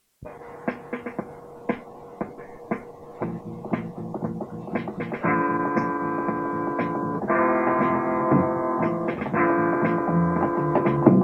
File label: noisegate